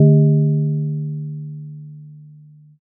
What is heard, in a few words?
gong